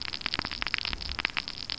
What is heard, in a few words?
torch